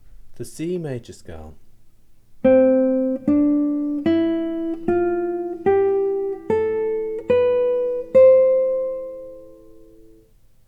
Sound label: C Major Scale High, guitar